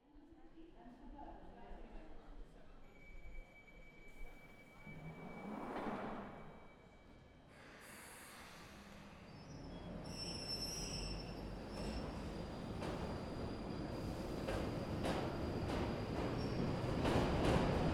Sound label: Brösarp soundscape